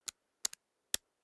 small book riffle through erratic

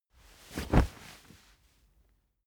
camping chair sit fast

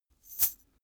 chain mail march single left

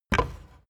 wood impact soft

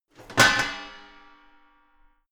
garbage can open lid hit wall hard

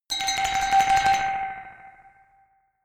glockenspiel dark tremolo high short

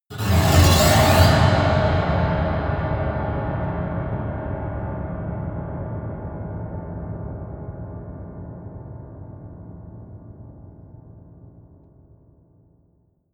piano twang fast squeal long

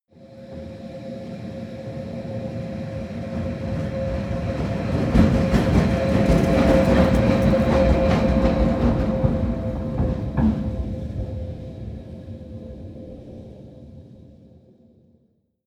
train locomotive drive by bump close

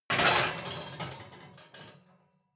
oven baking sheet draw fumble

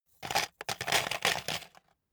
paperclips drop paper box

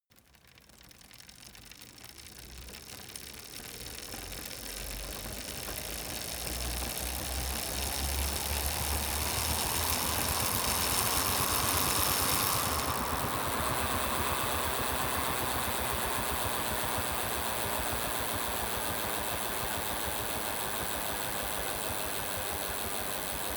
bicycle street accelerate high coast

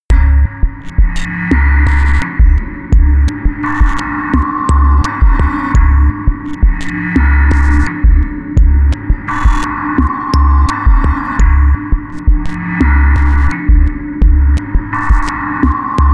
sequence electronic beat dark

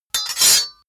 sword cut hard scrape short

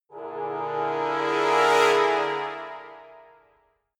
trombone crescendo short

